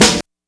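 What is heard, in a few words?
BLAST SNARE, Hip Hop Kit; E-MU Formula 4000 Series Vol. 1; Hip Hop Nation; Hip Hop Kit 2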